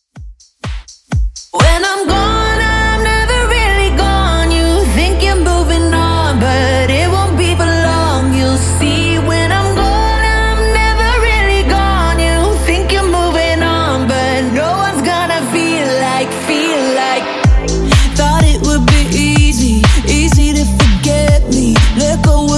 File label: Dj Intro Outro 125 Bpm